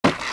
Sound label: BIG SMASH; Leonardo The Inventor; Broderbund; Riverdeep